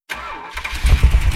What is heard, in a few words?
challenger engine start